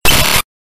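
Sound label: program oshybka, program error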